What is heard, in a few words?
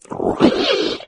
melee, flood